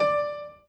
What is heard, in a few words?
Piano Chord